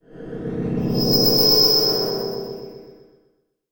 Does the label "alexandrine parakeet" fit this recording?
No